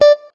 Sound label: note beepey